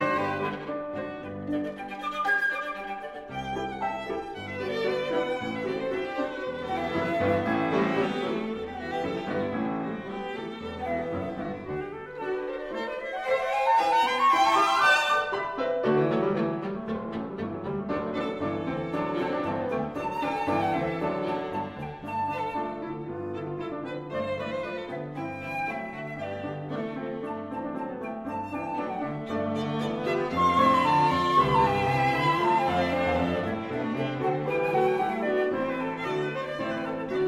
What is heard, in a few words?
for flute violin viola and piano; suite dans le style ancien; divertissement